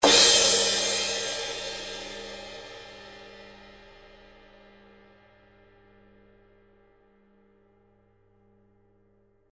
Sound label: CRASH